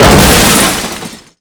Impact Large; Totaled; XBOX

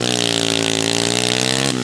power, Engine

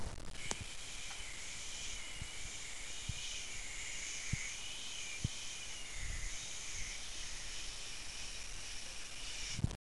bg noise, casino; Bicycle Casino; XBOX

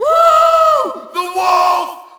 Shout, audience; UFC Tapout 2

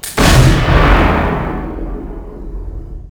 camera shot

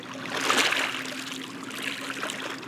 river, chicago

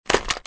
ready gun; Soldier Of Fortune II Double Helix; XBOX

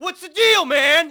yell; Matt Hoffman's Pro BMX 2; XBOX

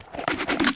Spin, weapons; Soldier Of Fortune II Double Helix; XBOX; silver talon

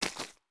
FS Mud, footsteps

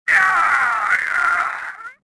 death, swamp

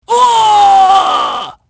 dead wom, female; voices; Pirates Of The Caribbean

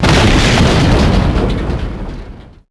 helicopter platform break; Max Payne; penthouse

